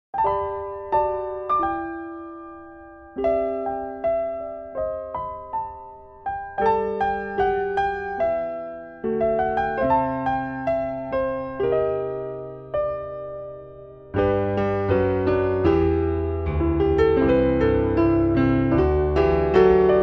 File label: piano